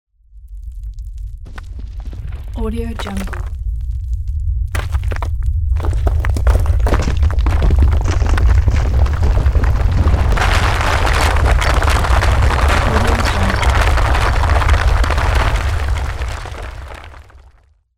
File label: rocks land slide